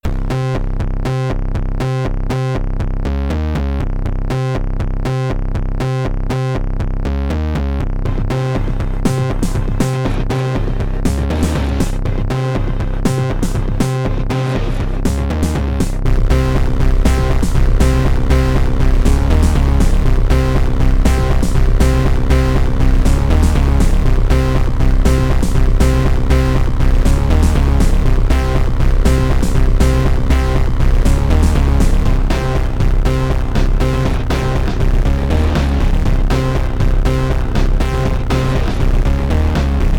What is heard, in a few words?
Industrial - Fuse; Project Warlock